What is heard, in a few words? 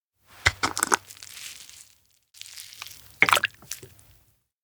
shiokara jar open add coffee; anemoi